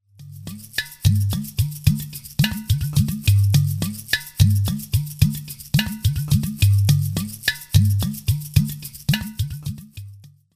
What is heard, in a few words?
udu